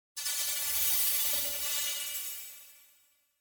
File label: Granular Feedback Tone Thin; Hollywood Edge; sound designer's toolkit v2